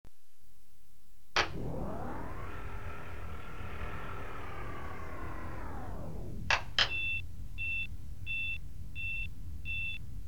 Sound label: disintegration booth; Eminiar 7